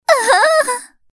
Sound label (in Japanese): あはぁ〜んアハ; echioto